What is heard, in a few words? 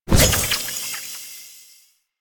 Fishing Spear Throw; Warframe; Weapons; Eidolon Fishing Spears